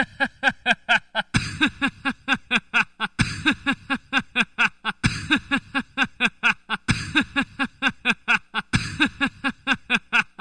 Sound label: Don't Laugh (acappella)